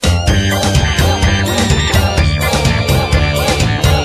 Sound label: Jingle Clear; Taiko no Tatsujin DS Touch de Dokodon